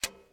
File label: button down